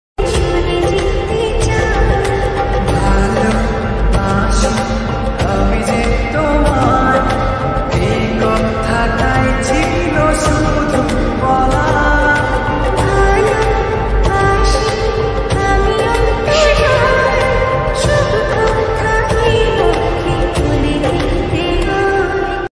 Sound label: bengali song ringtone